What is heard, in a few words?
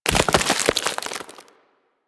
Ice Wave Spawn; Enemies; Abilities; Warframe; Corpus; Arachnoid Camper Terra